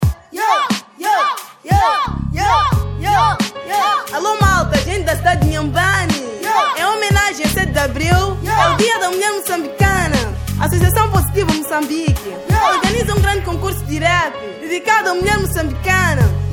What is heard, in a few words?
hiphopcontest jingle